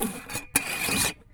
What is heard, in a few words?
Metal Lid; BB Retail Therapy Sample Pack